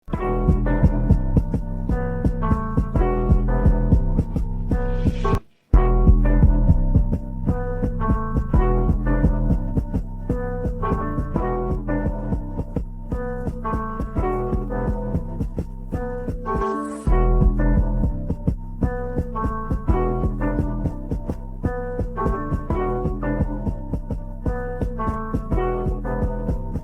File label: instrumental